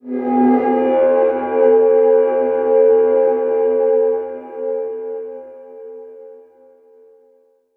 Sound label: VFX Atmos